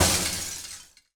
spotlight smash

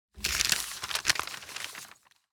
magazine pages flip; turn

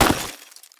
rock smash

